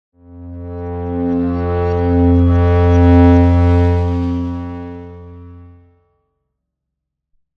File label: Tone